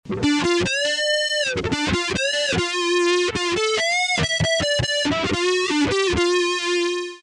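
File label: RP100 Drive And Chorus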